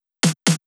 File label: Drum Loop 128BPM SN; VEC5; Drumloops; Multi Loops